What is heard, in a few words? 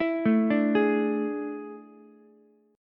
A Major 7th Suspended 4th, Ukulele; AM7sus4; arpeggiated; JGuitar